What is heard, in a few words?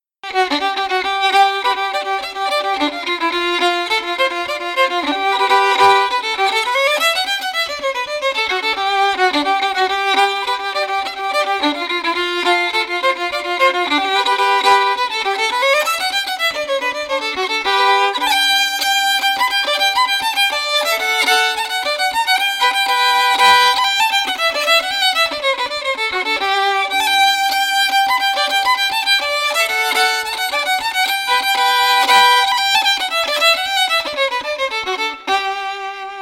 yellow heifer fiddle; tune tutor; SeamusGrantFestival